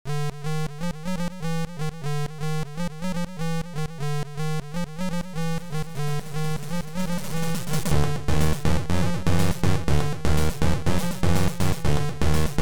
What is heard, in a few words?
Minisong